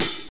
90s studio open hi hat sound f key, Royality free open high-hat sound tuned to the F no